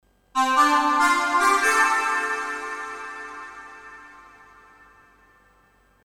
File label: Iridium Core Vector Synth